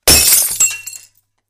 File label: Glass Break Single Lg; windows & mirrors